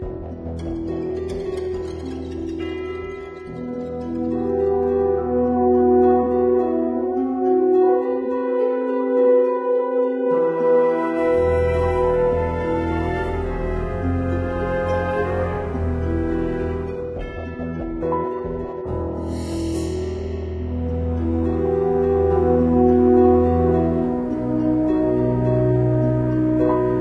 Study Music; Jewel Quest Solitaire II

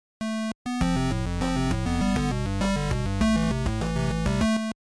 Fail; kardia fantasy mamono hime tachi to bouken monogatari; android

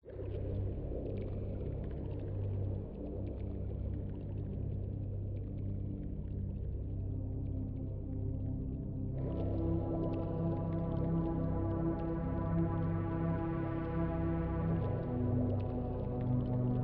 ambiance; Kaos

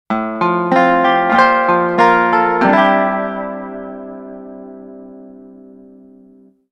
Music Event

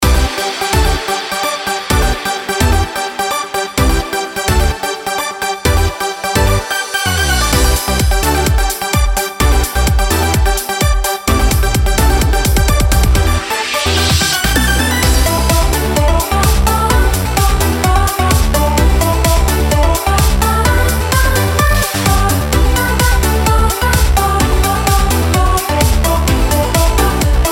Raid Boss; Mist Train Girls

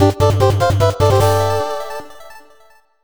Blast jingle; Fairune Collection; PS4 Switch Windows

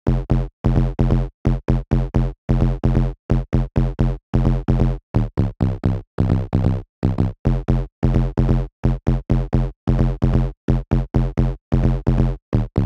Bassline; chippy

